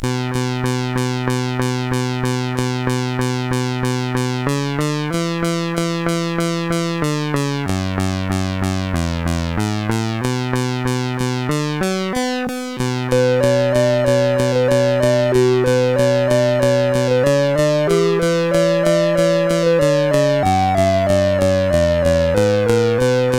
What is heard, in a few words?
Jingle from advert, CSG8580R5; Lenor; hvsc